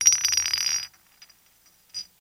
Bottle Roll Around; bottles

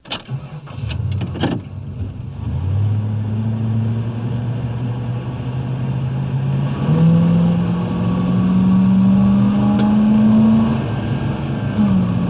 Mustang Interior Start Out With Hard Punch

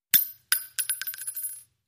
Shell Case Sngle Tube, shell casing movement

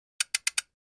Flash Gear Four Low Pi, flash gear

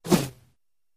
Arrow Whistle, bow & arrow

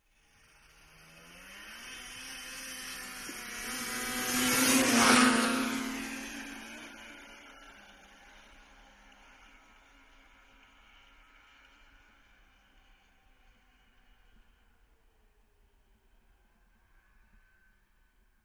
Snowmobile Steady

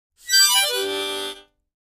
Mouth Organ Glissade, harmonica; woodwinds

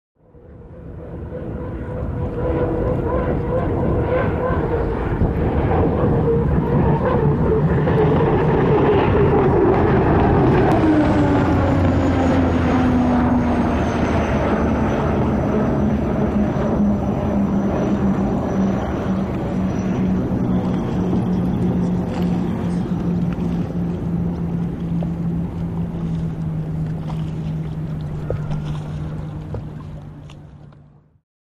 Hydroplane By Ambie

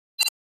Button Load Beep Ta; buttons & beeps

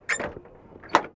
Cessna Door Open Inte

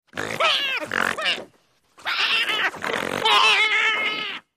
Violent Cat Snarl; animals cat

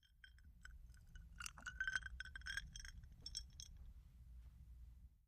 Ice Tinkling Glass

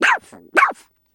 Dog High Pitched Sque

